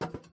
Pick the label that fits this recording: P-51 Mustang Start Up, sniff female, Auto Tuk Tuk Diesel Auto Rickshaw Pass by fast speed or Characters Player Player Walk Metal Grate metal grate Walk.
Characters Player Player Walk Metal Grate metal grate Walk